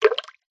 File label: cartoon water spill; zapsplat